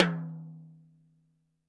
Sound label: TD-20 Tom A, Roland TD-20 Toms